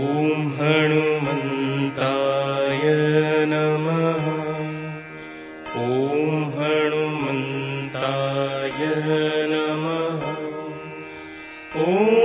Shree Hanumanji Mantra

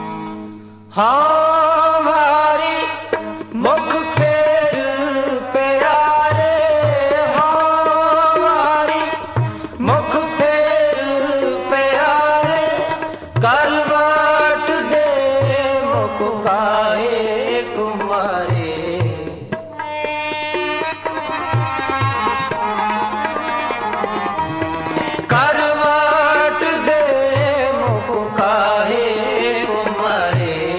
Sikh Ringtone

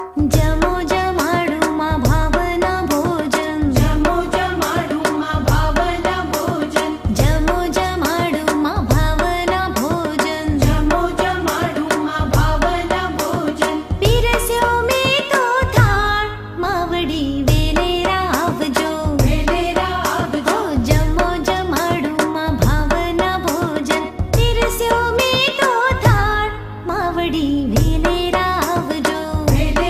Gujarati Devotional; Mataji No Thal

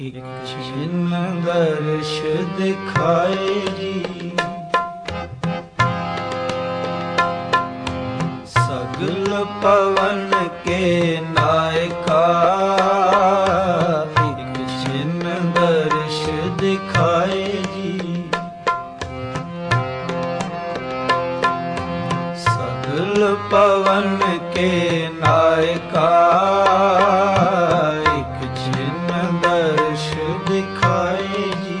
Sikh Ringtone

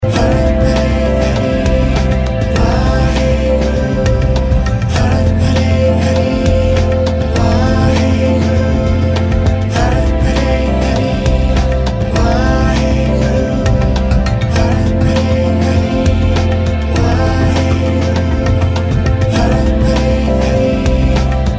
Sikh Ringtone

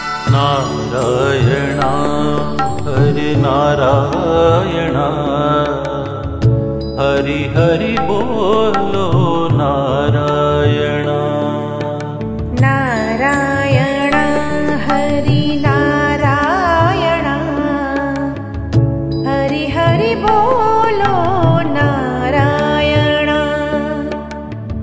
Narayana Hari Narayana Dhun

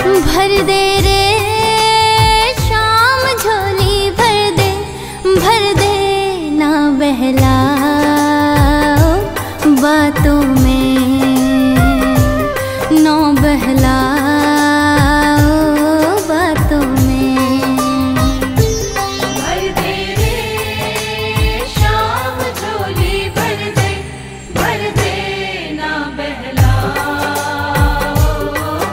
Shyam Jholi Bhar De Female, Bhakti

